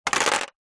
household necklace small stones pebbles set down plastic surface